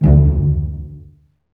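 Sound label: STRINGS SECTION 5; Roland - LA Composer vol. 1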